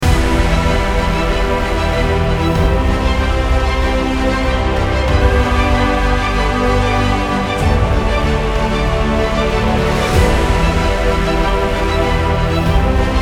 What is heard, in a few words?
dramatic uplifting loop